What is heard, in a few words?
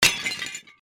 breakpot; Keep Out! A Dungeon Adventure for the Brave